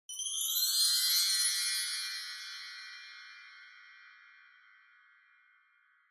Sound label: Wind Chimes Reverb Up Low